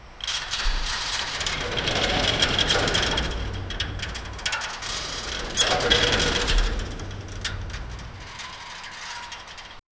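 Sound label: subtle metal locker expanding; industrial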